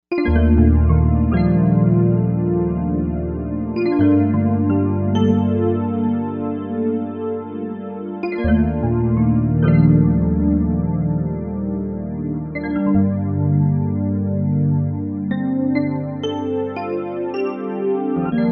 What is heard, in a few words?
Bright EP-Pad, Novation UltraNova; Factory Patches